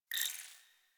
UI Mod Screen Energy; DE; Warframe; Railjack Player Ship

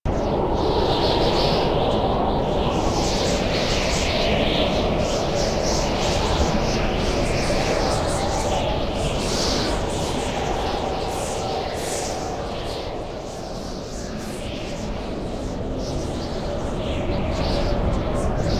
Tower Ext Rooftop Wind; Warframe; Quests; TheNewWar; Ambience; Cetus; Unum Tower

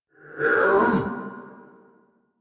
Attack Effort; Dialog; Barks; Battle; Heavy; en; Warframe; Dax

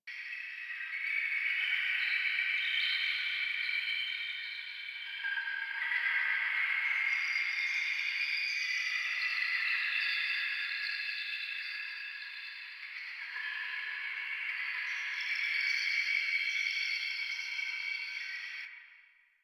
Interior Caves Bog Loop, Ambience; Warframe; Infested Eris

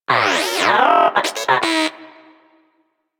Moa Inquisitive Vocal Battle Ends; Warframe

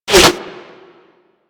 Bullet Default Hit; Warframe

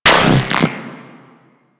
Aim; Infested Tentacluster

